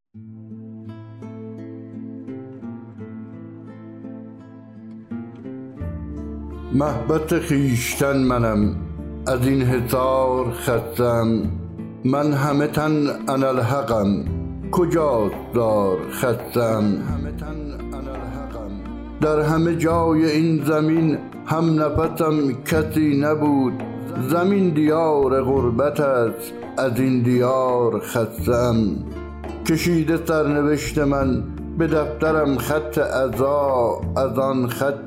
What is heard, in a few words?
Vocal